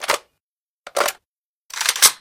generic reload, weapons

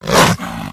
psy attack, pseudodog; monsters

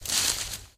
bush, human step

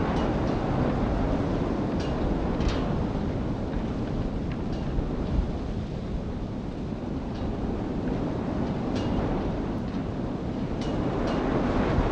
wind city, ambient

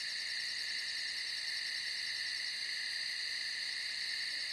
night background; ambient

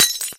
glass fall

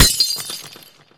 glass, bullet collide

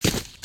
earth, human step

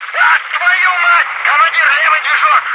damage, helicopter; vehicles

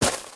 gravel, human step

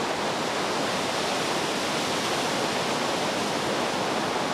swamp wind; ambient; outdoors

